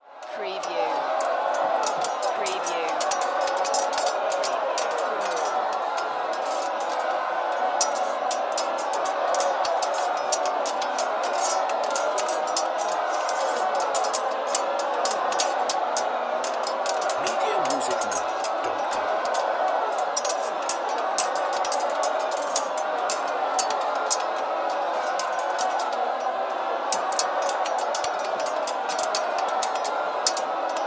Warfare Medieval Battlefield Ambience Shouts and Sword Clanks